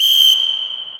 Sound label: whistle